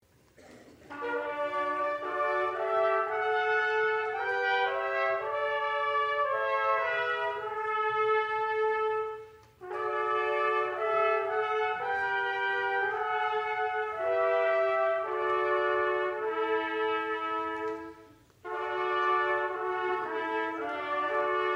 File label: trumpets; I Know That My Redeemer Lives